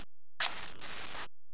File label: match; 12000 Graphics Pack - A Complete Graphics Collection For Your PC